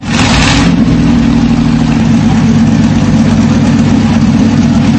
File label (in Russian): zvuk vilivayushejsya zhidkosti iz avtocisterni